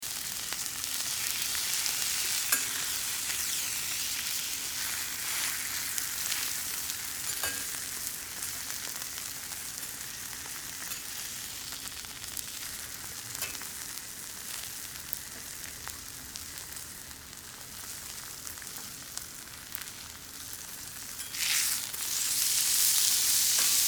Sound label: steak cooking